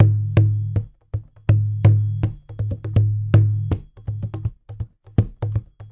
thuddy duddy hand drum, drum loops and breaks, 162 bpm